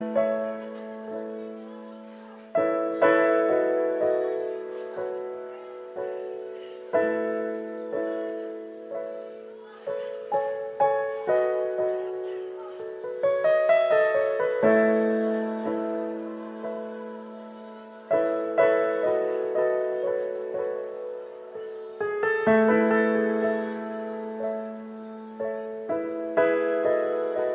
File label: Instrumental